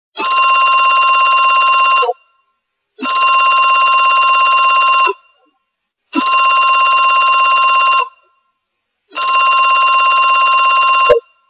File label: Old Phone Ring